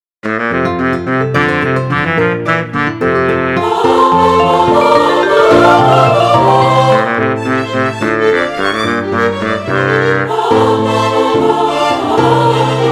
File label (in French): La bourree